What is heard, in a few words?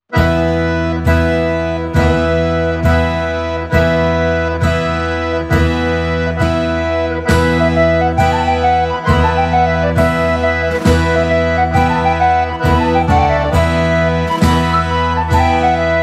Irish Jig